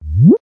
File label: whuuup